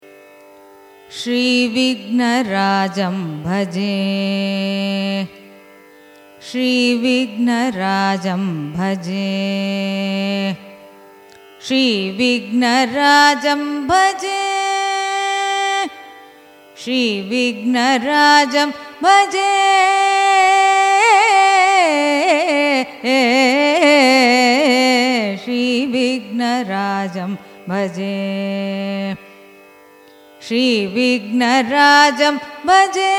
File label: raga gambheera nattai carnatic; shri vignarajam